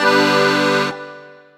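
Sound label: Accordion 098bpm G end, African; Quazibeat - African Secret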